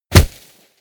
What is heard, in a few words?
body fall snow low punchy